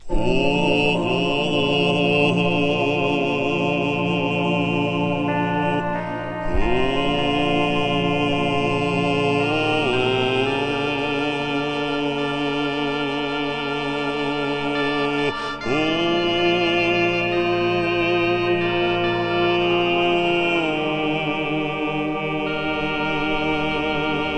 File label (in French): Les clochettes; Kolokoljtchik; Arrgt A. Cazade